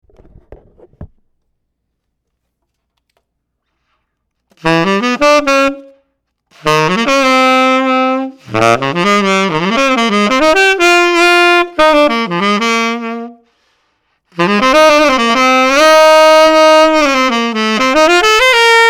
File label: Lupifaro tenor